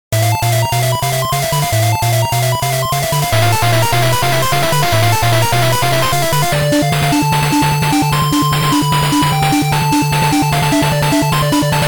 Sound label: 8bit boss; act08 boss02